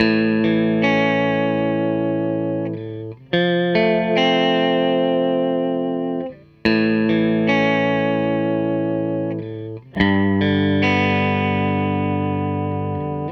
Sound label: Les Guitar Loop